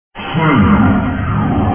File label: failure